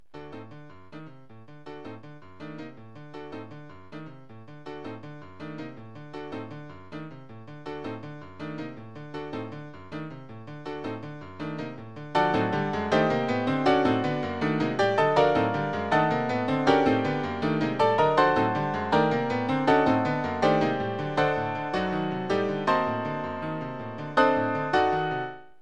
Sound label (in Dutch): voor twee pianos